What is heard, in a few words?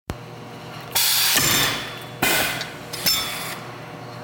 Stamping